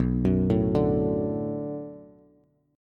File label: B Minor 7th B Bass, chord; Bm7; arpeggiated; JGuitar